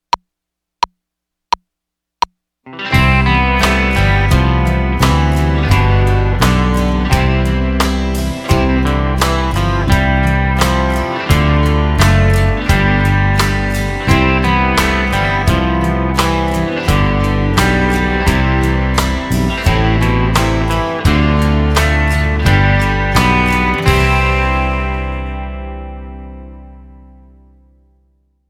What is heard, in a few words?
Major Scale Melody